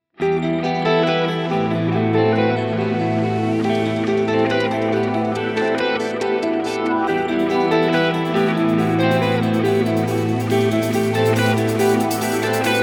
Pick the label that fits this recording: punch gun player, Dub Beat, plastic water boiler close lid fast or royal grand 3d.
Dub Beat